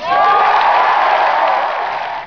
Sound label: Cheers; the Simpsons Slots